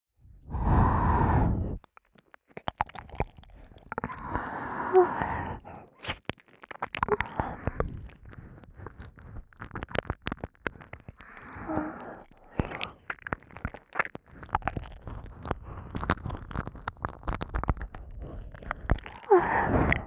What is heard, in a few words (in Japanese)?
右 耳舐め 優しく レロレロ; 【舐め音特化】耳の奥底で蠢いて～舐め音に溺れるバイノーラル～; ただ舐め音に溺れる